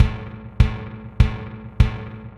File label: Metal Tank Kick, processed; Sonic Foundry (Sony Creative Software)